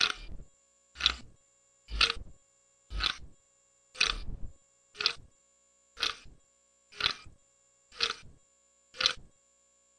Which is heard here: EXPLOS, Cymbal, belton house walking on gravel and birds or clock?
clock